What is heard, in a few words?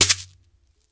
Berimbau Shaker, World Percussion; Sony CS